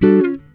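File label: guitar chord funk; Univers Sons; Jazzistic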